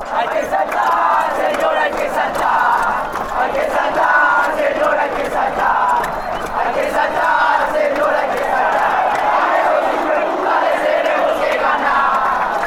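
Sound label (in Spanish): atletico nacional chant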